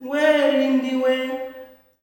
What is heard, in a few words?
"WEY-WEY", MALE; Spectrasonics; Heart of Africa 2; S.MALEAMB